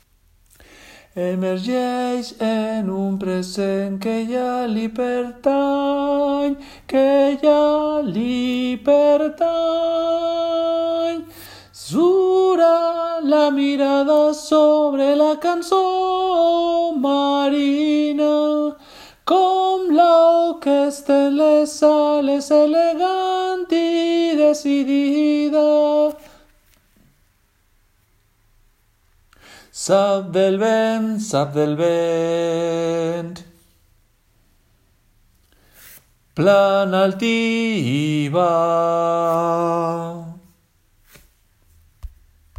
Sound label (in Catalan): Cançó de mar SOPRANO